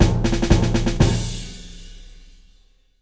Jingle Title; Jungle Crash Land; Android

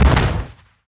Bullet Shot; The Binding of Isaac

